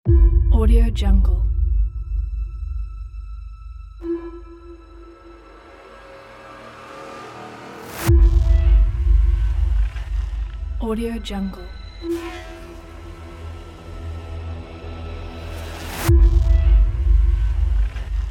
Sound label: cinematic trailer intro